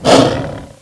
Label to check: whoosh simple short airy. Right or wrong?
Wrong